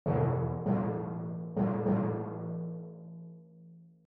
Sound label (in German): Pauke; Instrumentenkunde